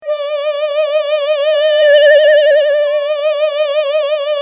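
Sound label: shakuhachi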